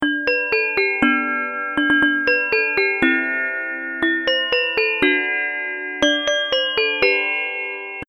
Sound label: jingle